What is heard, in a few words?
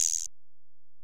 EPerc, Alesis DM-PRO